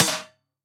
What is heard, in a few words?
SNARE EFX 4